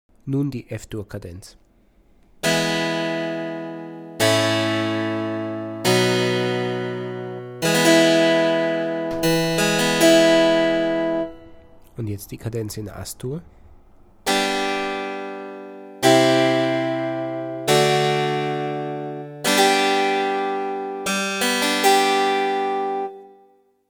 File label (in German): kadenz gleichstufig; Bach